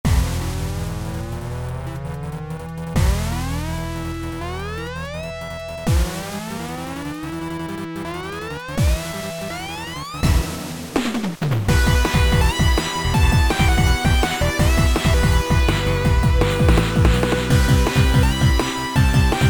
Sega Genesis Remix

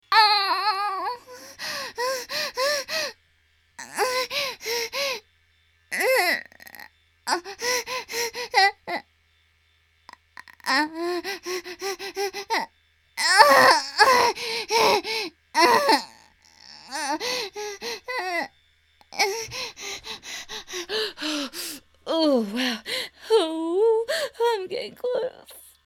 choke

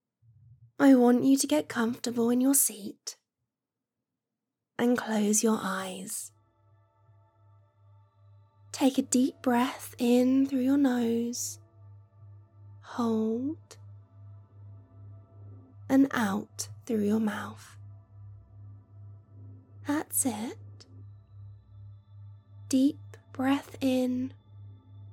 meditation (music); Cuck training centre

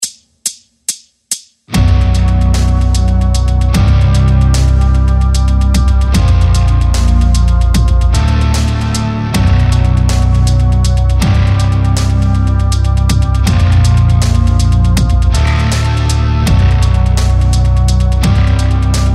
Sweep Picking Workout Backing Track

